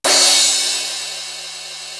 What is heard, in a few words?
CRASH, PROC. CYMB; East West; Global Sounds